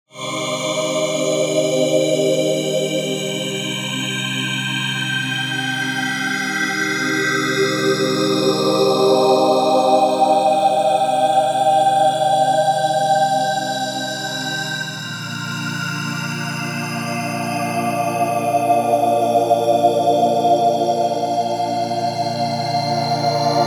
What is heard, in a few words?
supersaw; fft randomizer